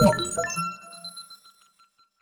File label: ui notification; Spacebase Startopia